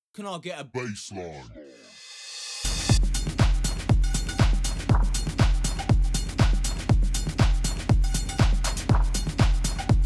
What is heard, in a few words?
drums style